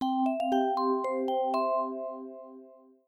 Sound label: Level Up; habitica; luneFox Theme